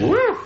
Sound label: whew, human; Voice; ooh ahh